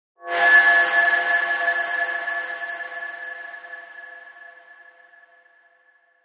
Feedback HF Distorte

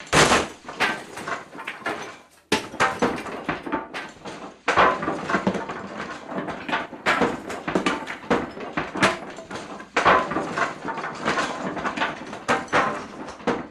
Boards Falling, ransack room

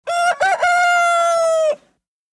Rooster Crow Odd

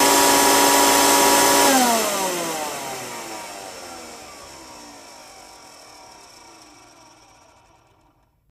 Steam Cleaner, power on off

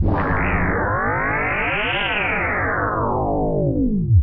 pulse rise, drones tones

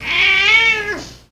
Cat Disgruntled Meow

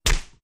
Big Face Slaps Huge; flesh

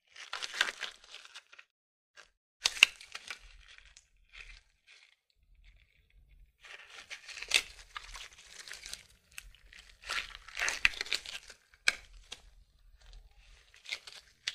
Rubber Gloves On Off; On / Off 1; Plastic Surgical Gloves Going On / Off; Snap, Close Perspective.

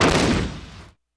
Cannon Explo Fire; shots

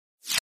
Pop Up Short HF Elect B, pop up element

